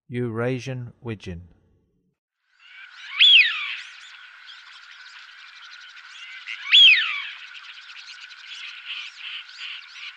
Eurasian Wigeon, Songs of Birds of North America; Western USA; Western USA Songbirds

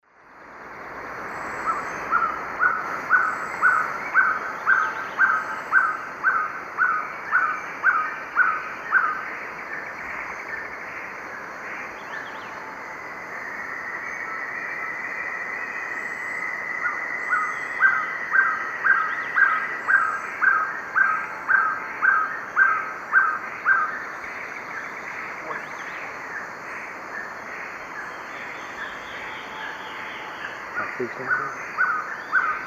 Trogon violaceus, song; S. America; Eastern Lowlands

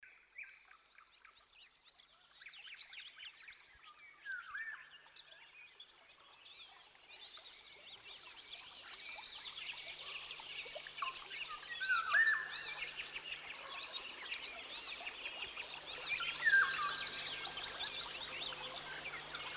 Rainforest Rainfall

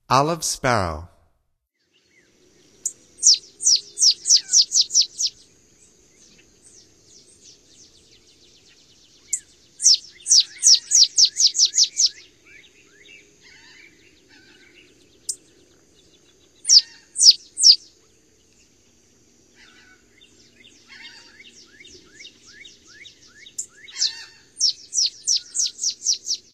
Olive Sparrow, Songs of Birds of North America; Stokes

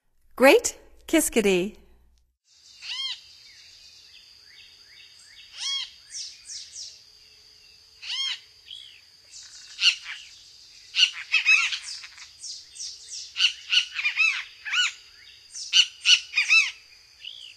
Great Kiskadee; North America; Stokes

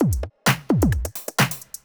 electro kit 130

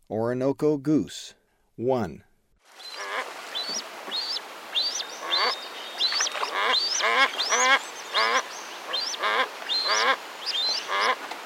Orinoco Goose, Birds of Ecuador; S. America

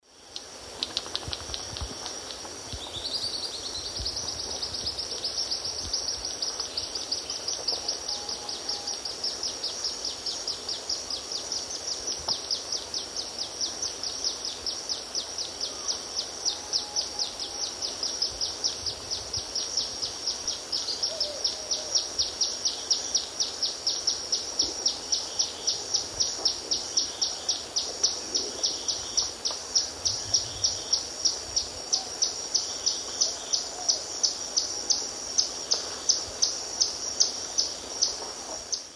Todirostrum chrysocrotaphum, bill snaps and song; S. America; Eastern Lowlands

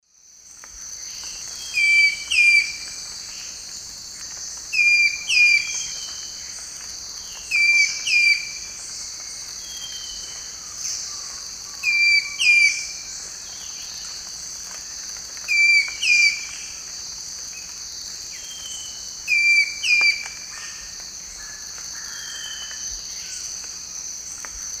Hyloctistes subulatus, song; S. America; Eastern Lowlands

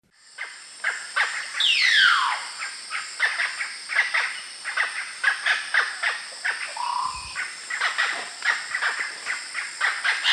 Clypicterus oseryi, calls and song; S. America; Eastern Lowlands

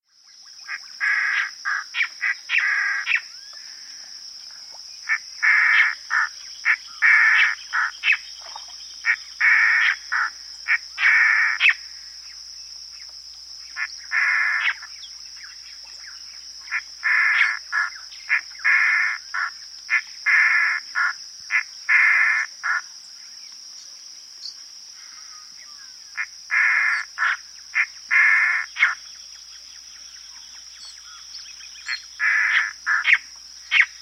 Mato Grosso Antbird, S. America

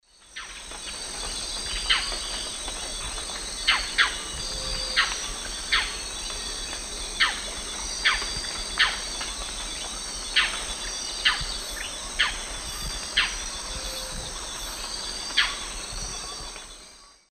Automolus rufipileatus, call; S. America; Eastern Lowlands

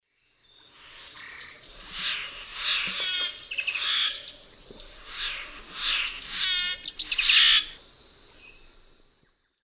Gymnomystax mexicanus, song; S. America; Eastern Lowlands

